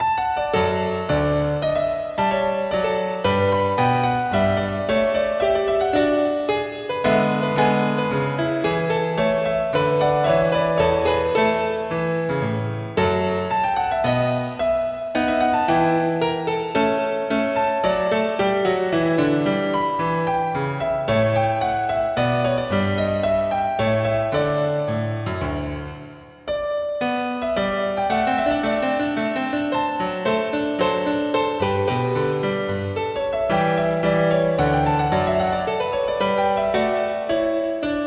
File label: Minuet for Piano